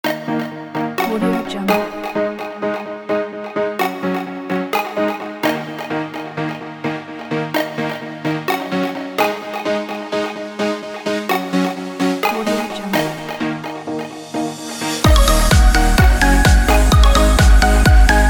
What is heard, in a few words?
uplifting house